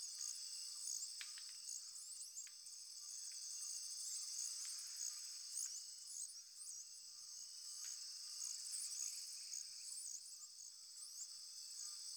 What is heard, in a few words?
Critter Loop, Glades; Ambience; Glades Of Andurin